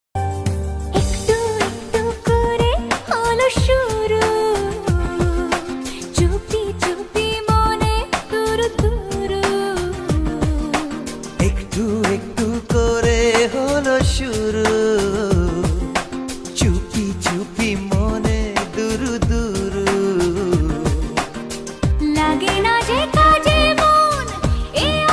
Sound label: Bangla Song Ringtone